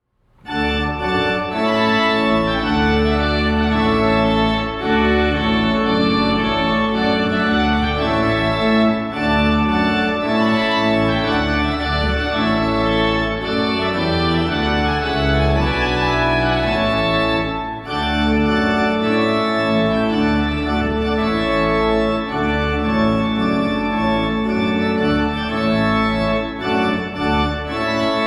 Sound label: Trumpet Tune; Purcell